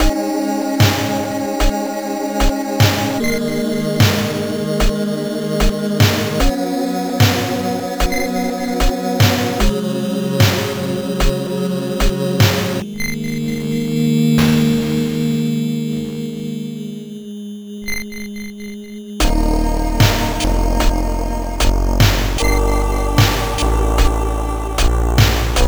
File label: amiga in game; turrican iii